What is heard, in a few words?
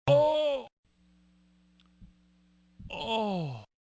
BIG moan